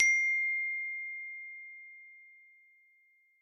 sms alert note

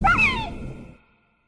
loud hoot

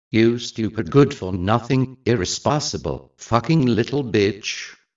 Hahahaha

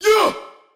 "Yuh"

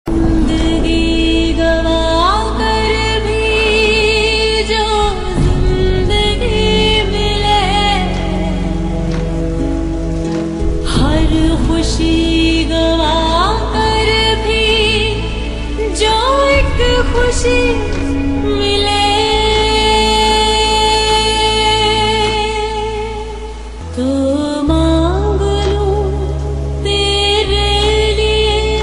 Female Sad

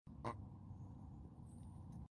Burp

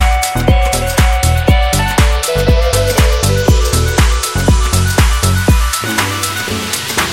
Jingle Bell Remix

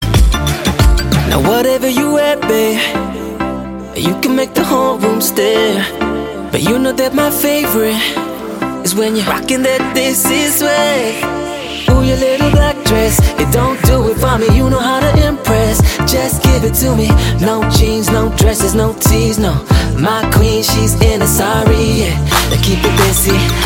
punjabi tone

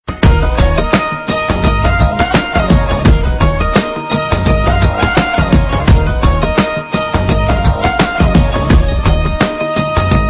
Stunning Guitar

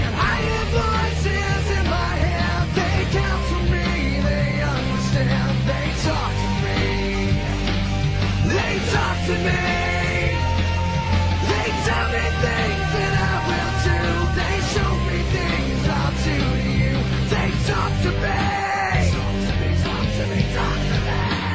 Voices

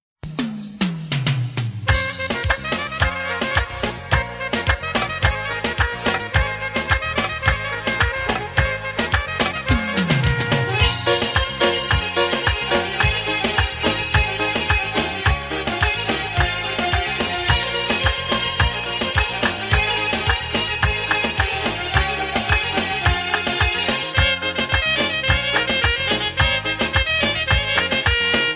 Assyrian

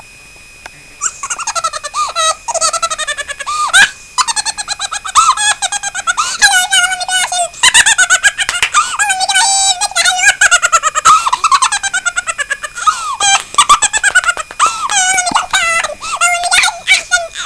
Funny Lough

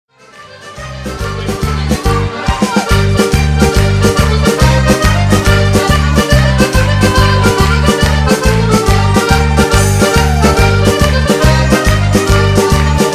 Tarantella napoli

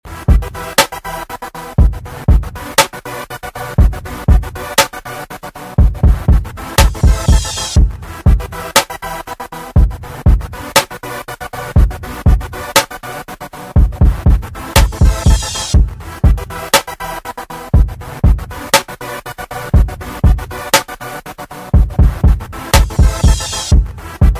Instrumental

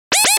low health; Doom

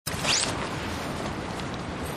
taking off belt

